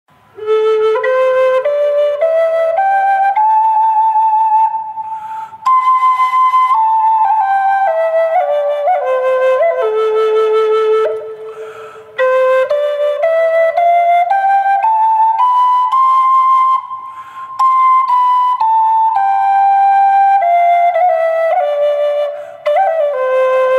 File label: Am wet, flute